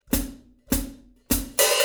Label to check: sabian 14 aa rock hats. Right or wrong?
Right